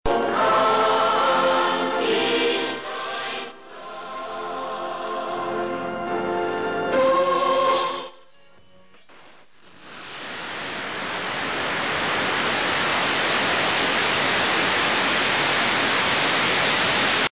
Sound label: am drm